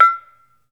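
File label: SARAN E5, Metalophones, Pitched Percussion; E-MU Producer Series Vol. 7 – Old World Instruments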